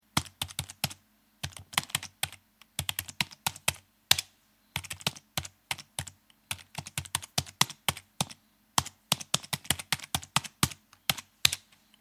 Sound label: Keyboard Type, Tapping keys on computer keyboard.; Keyboard Typing; Offices